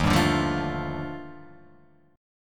D sharp Major Sharp 11th D sharp down, Guitar Open F; strummed; JGuitar